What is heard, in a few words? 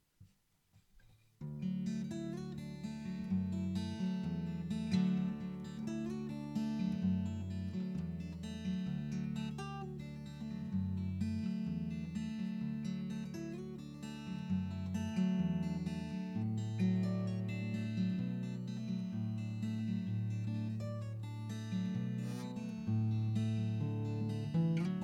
guitar